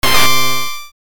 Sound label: buy; PPdastr Studio